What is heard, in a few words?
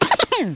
laugh; gubble